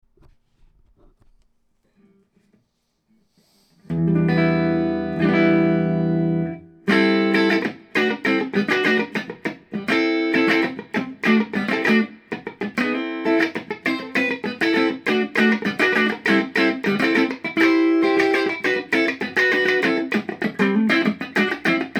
LP93 clean 490R neck, gibson les paul

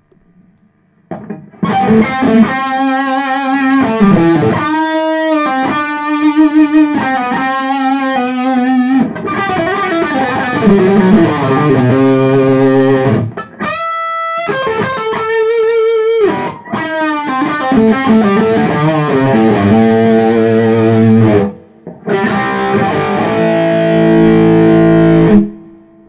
Gibson Les Paul disto Burstbucker 2 bridge

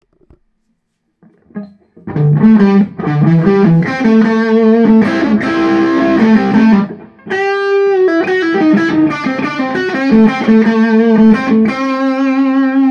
LP93 OD 490R neck, gibson les paul

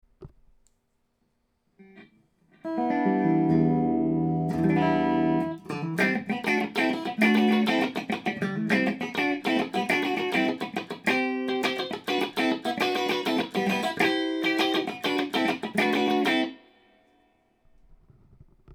LP92 clean mix both 490R 498T, gibson les paul

